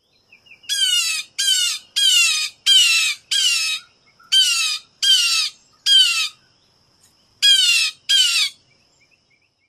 jay calls

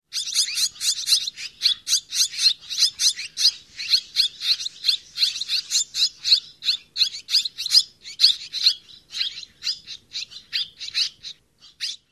begging calls

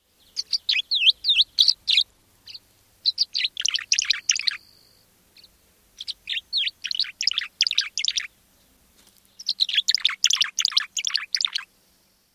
perch songs

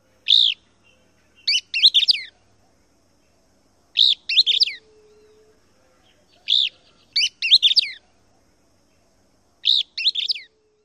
calls; TX

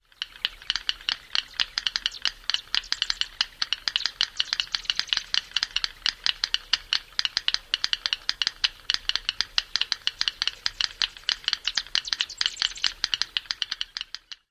several individuals clicking

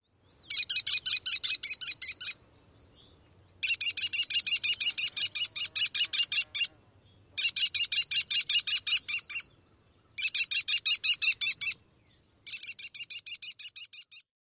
klee-klee-klee alarm call

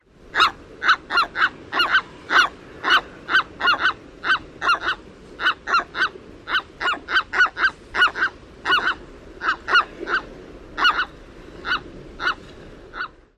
gruff calls

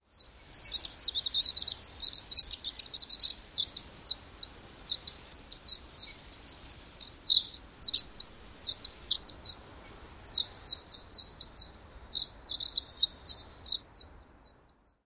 calls

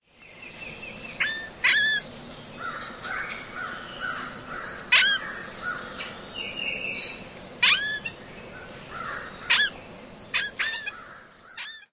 whines

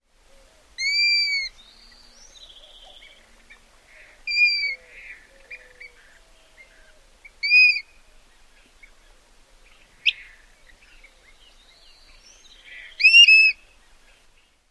plaintive whistles amp other calls

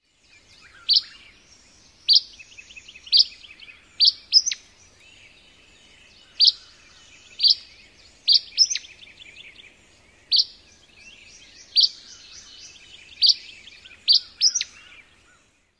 twilight song

